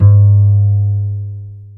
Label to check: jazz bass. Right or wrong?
Right